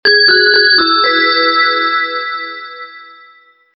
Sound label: Bells